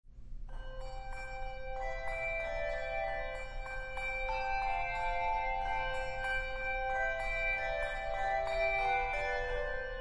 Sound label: Handbells; Chorister's Guild; Composer: Ingram, Bill